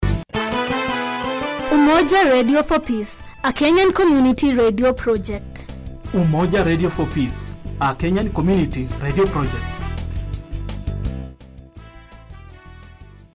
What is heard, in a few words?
Radio Jingle; Radio Waumini